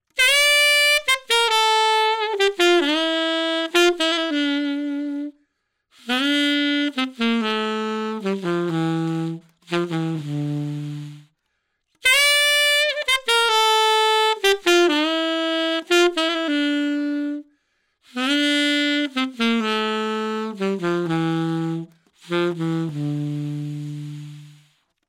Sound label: Albright with Cane Reed